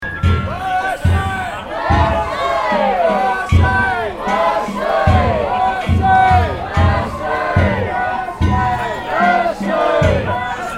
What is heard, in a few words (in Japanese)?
お祭りの掛け声